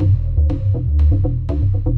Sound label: dur loop